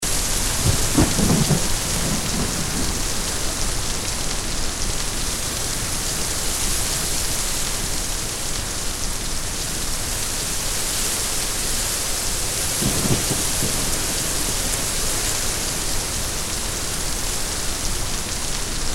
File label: thunderstorm